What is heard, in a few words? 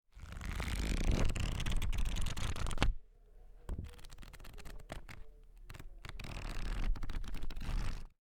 Flipping Through Pages Of A Book